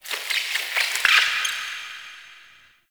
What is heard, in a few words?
Critter Squeak; Ambience; Warframe; Nokko Colony